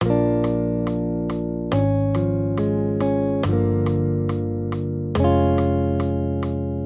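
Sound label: Guitar, Accompaniment, Major Triads, Bb-Db, 140 BPM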